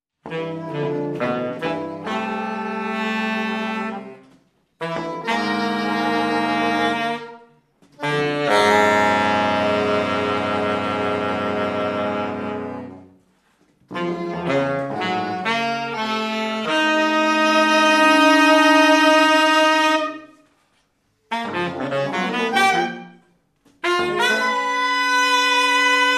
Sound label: SAX MAFIA